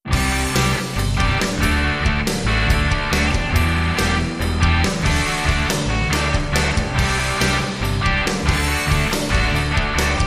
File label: No Synth